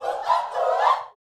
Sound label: shouts, gospel; Spectrasonics; Vocal Planet Vol.1 Gospel; akai; UYC SHOUTS